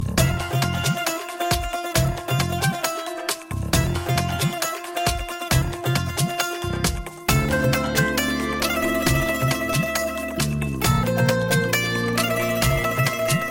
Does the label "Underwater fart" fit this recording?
No